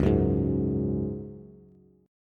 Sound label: Db Suspended 4th Db, Bass; strummed